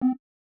check off; osu!; Luminance - Fluorescence

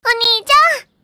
combo burst; osu!; Retronix 2.0

